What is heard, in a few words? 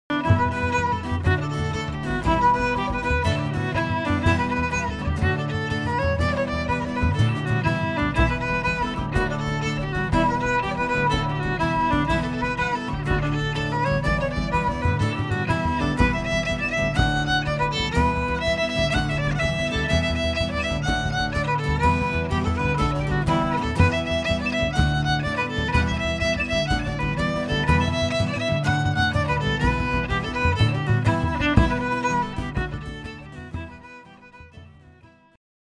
jigs; The Creel of Turf